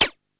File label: FM Cymbal, harsh; Harsh FM Cymbals